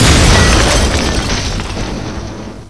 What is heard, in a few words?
destroy; MAXOVER